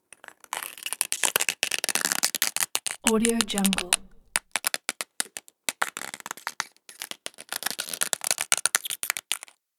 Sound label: elemental ice crackling; soundmorph